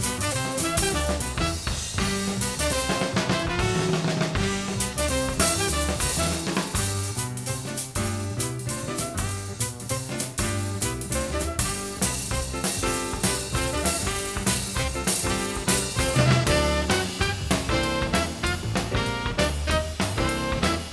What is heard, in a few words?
jazz